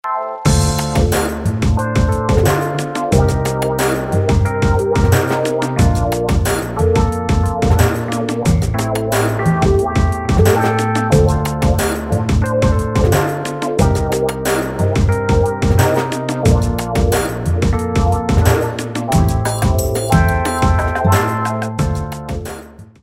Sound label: WAH WAH PARTY; Suite Coll. Vol.8